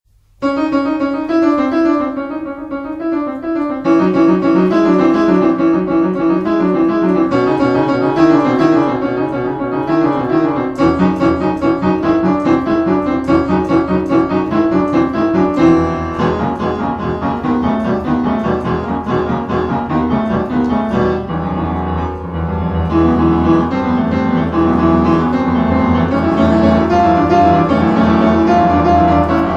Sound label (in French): PRELUDE 9 EN MI MAJEUR